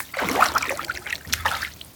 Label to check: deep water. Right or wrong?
Right